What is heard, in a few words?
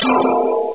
glang, ambience